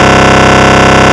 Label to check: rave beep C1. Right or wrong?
Right